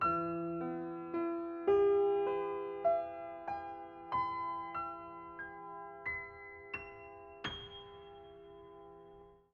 gameover; Manamon Music; Manamon 1

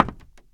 wood step; Golden Crayon Sounds

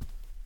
carpet step; Golden Crayon Sounds

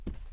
carpet step; Golden Crayon Sounds

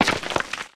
ledge step; Golden Crayon Sounds

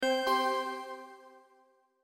Popup Appeared; finn's sounds

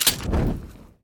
flamethrower draw; Original TK Sounds

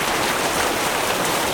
heavy water; Original TK Sounds

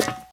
metal step; Golden Crayon Sounds

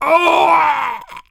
death; Original TK Sounds

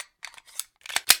glock reload; Original TK Sounds

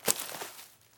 brush step; Golden Crayon Sounds

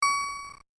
Reorder, 8 Bit; ESAN VO Sound Schemes; 8 Bit Sound Pack V2

